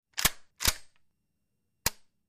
Big Shotgun Pump Dry; mvmt

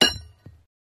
Horseshoe Hitting

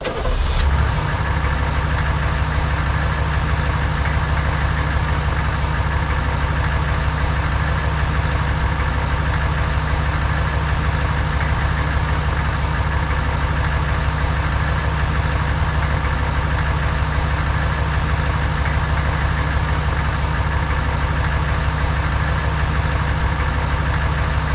hummer start idle

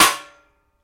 Hub Cap Being Struck

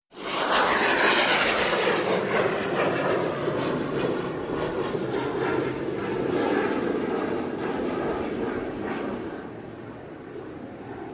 Jet Take Off Lng Fade, jets; Jet take off, long fade

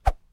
Baseball Arm Cloth W; baseball fx & games

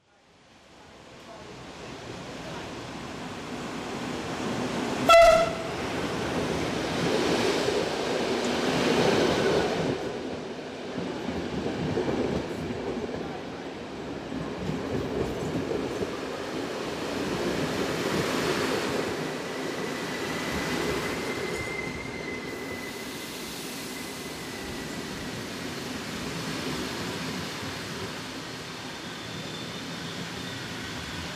Train Station europe

